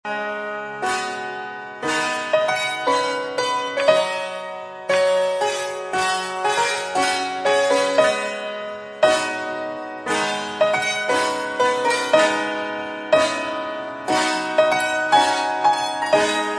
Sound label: prepared piano piece